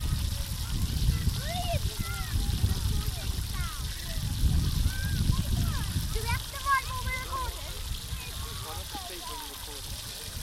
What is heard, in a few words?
splashing water